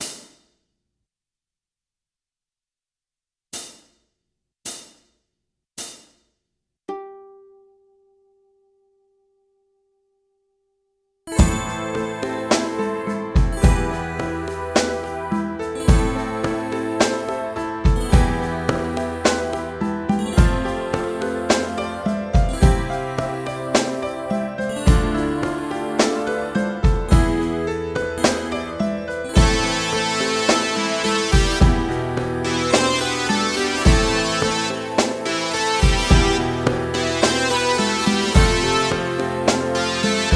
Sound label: instrumental